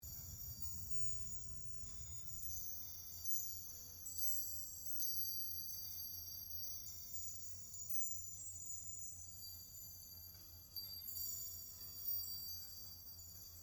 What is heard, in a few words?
Floating Symbol Ambience Quad Surrounds; Journey